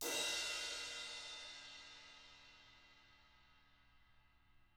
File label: ZILDP CRASH; CRASH 18